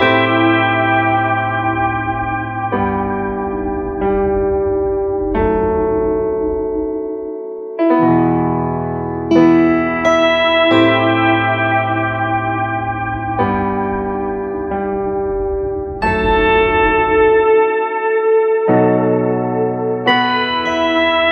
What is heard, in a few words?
piano keys, Loops Sad